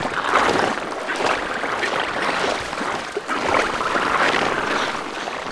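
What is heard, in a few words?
swarm; permanentaliens; giants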